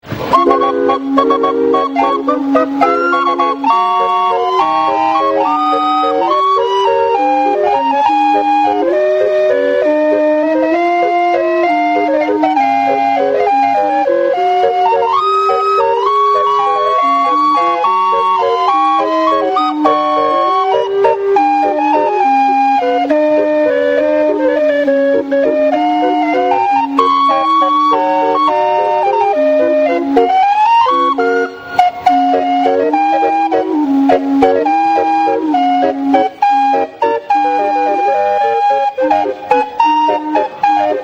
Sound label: calliope